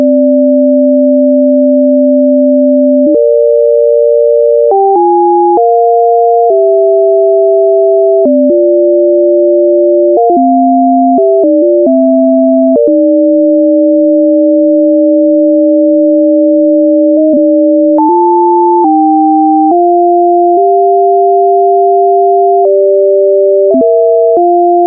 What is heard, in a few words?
staccato